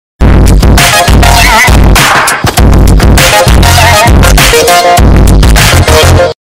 funky dance earrape; fortnite

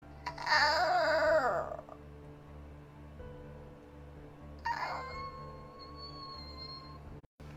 vtuber ohoooo

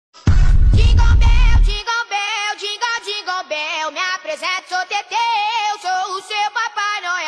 jingle bell

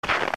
crunchy snow

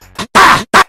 aah aah

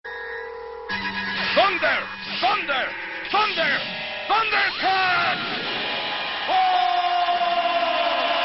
thunder

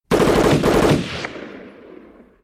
m16 rifle semi automatic sound effect

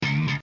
bleep

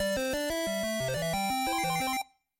8bit video game startup

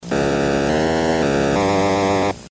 uaaaaa

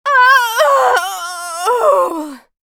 bed sounds

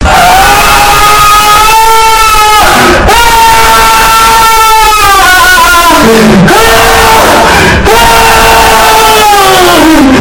scream meme earrape

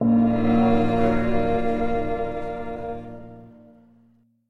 chant

